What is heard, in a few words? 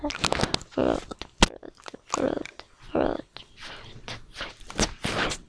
flute